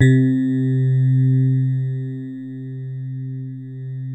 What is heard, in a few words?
DELICATE, DX RHODES; Best Service Advanced Media Trax Modern Composer